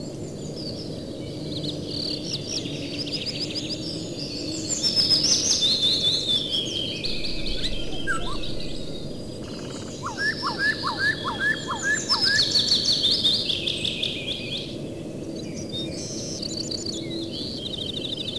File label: Amb Homeworld